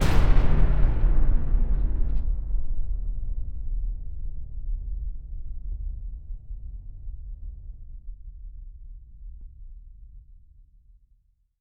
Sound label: Endless Doom, Atmospheres